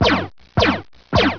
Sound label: LASER; 激光